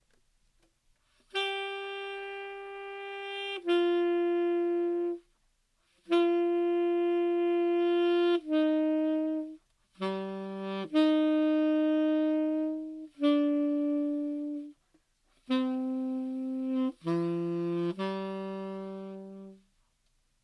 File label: sosa reposo piece tenor saxophone